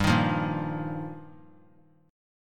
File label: G Minor 7th Sharp 5th; Gm7#5; strummed; JGuitar